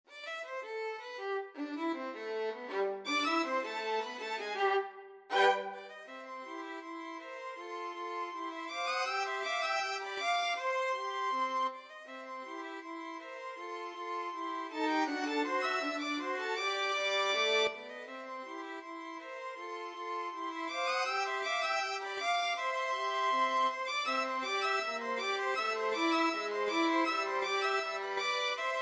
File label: violin trio